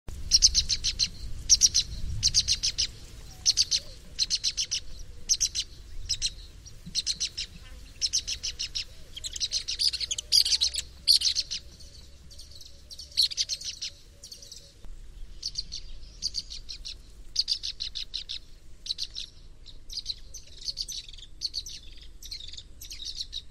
Pied Kingfisher Sound

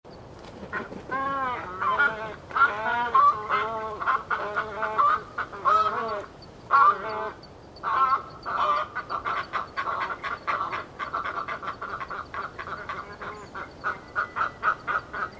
Ibis sound

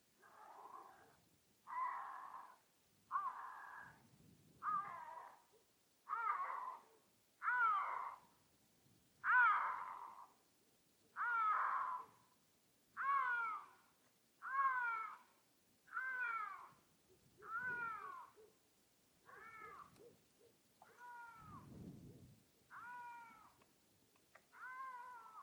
Lynx sound

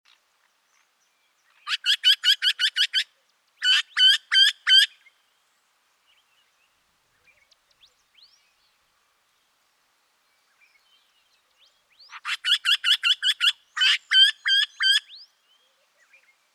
Wryneck Sound

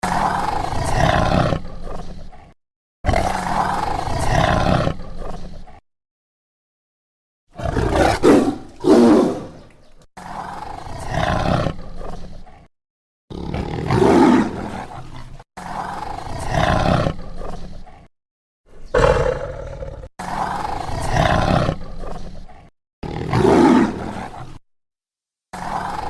Tiger Roar

